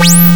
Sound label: RESO SQU